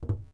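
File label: Metal run left, footsteps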